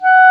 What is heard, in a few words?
WND CLAR, Clarinet Sh; Roland LCDP04 Orchestral Winds; 90 s Sample CDs